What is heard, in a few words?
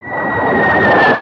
bomb whistle